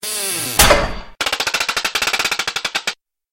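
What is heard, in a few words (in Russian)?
скрип и стук механизма ловушки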